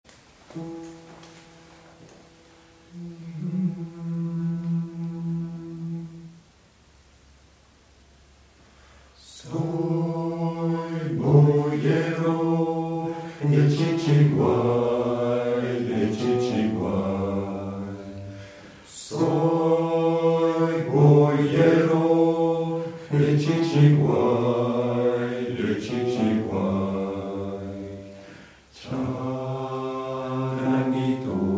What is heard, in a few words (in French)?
Soy Boyero Hommes